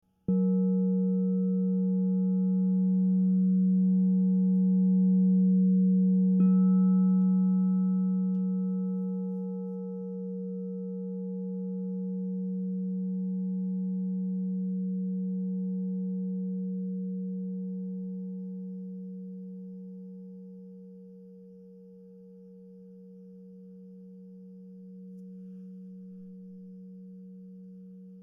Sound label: Crystal Tones Singing Bowls